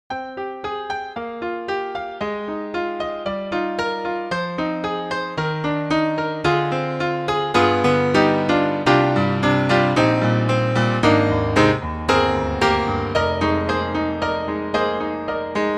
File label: bright concert piano; IK Multimedia; SampleTank2.5XL